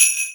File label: sleigh bells; Garry's Mod; tf2 content; tf xmas